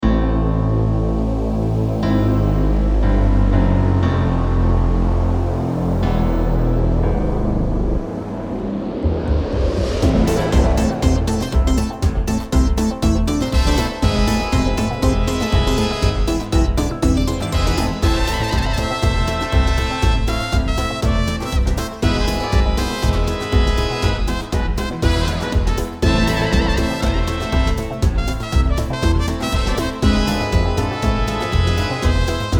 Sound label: electro swing